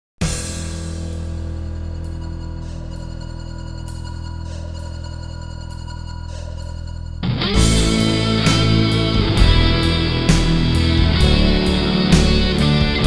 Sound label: Instrumental